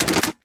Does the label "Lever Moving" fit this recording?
Yes